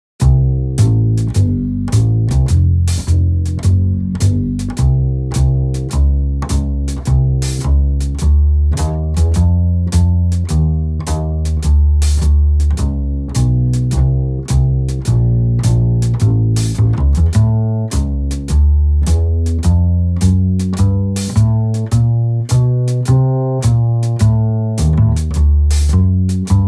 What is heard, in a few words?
blues, 105 bpm